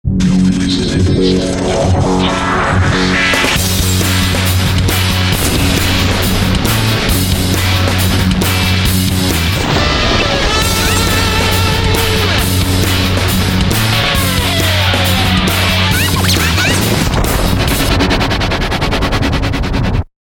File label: jingle; ubs